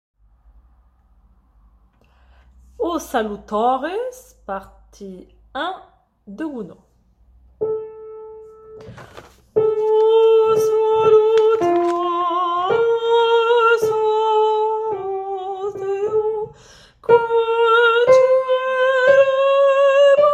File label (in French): Voix; oh salutaris gounod